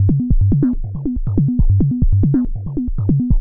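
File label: round arpeggio, 140 bpm; SYNTH AND ELECTRONIC LOOPS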